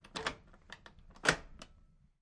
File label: Door Latches Locked